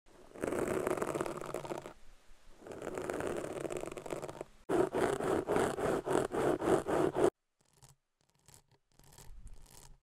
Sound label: microphone asmr; ضربه زدن به میکروفون; اسمر